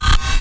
Metal fart, Cyborg Kit

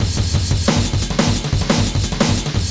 177 break, DnB Breaks; 141 to 185 bpm; Ethex

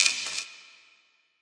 bigspace perc, Big Space Percussion; ETHNIC and WORLD PERCUSSION

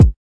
kik

